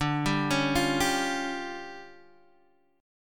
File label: D Minor Major 7th Double Flat 5th D Guitar Open D